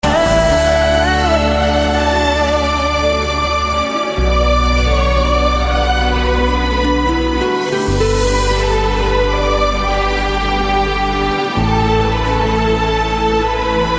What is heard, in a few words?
Sad Tone